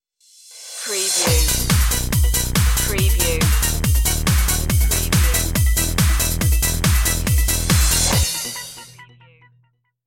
swirling beats stinger

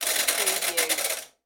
Washing Machine Dial; Sound Effects Bible

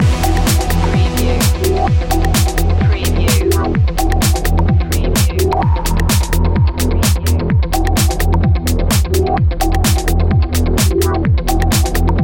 plurality move loop

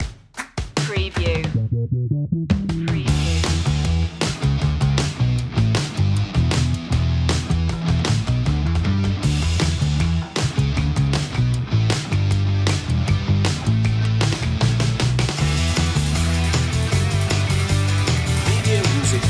high energy

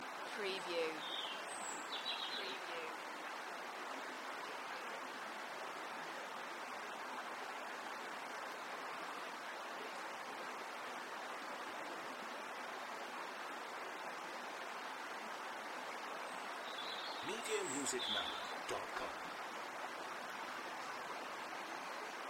River; Ysgethin River Loop; beanstalk audio

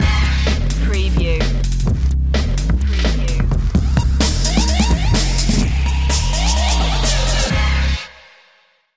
bass addiction stinger

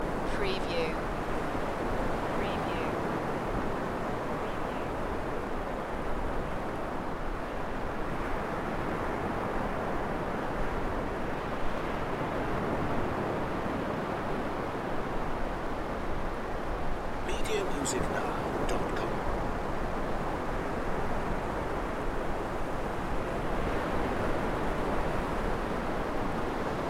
Fairbourne beach waves

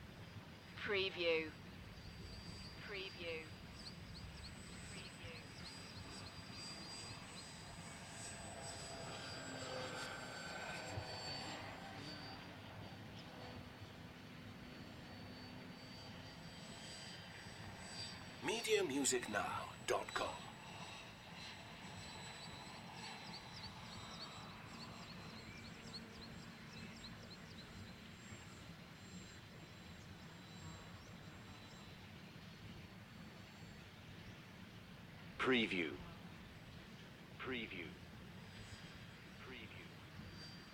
Remote control plane fly over with nature sounds; beanstalk audio

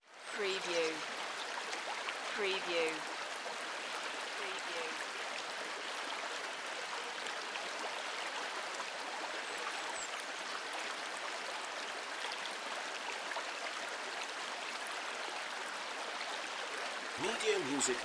Welsh babbling brook; beanstalk audio

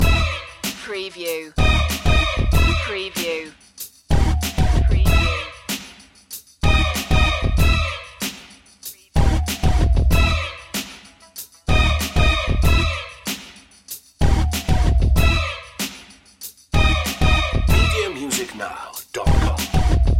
hip hop loop

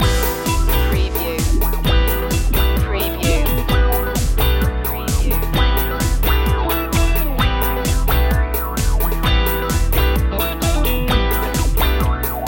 house band loop

